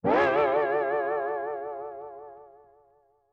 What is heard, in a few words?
guitar; Color change